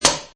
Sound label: Metal Can hit; Metallic